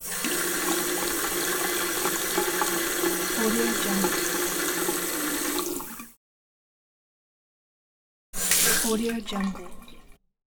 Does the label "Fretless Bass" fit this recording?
No